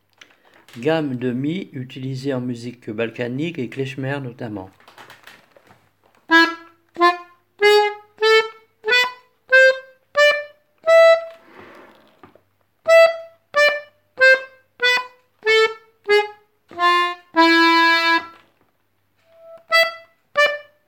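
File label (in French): Autre gamme de Mi utilisee en musique balkanique; Gammes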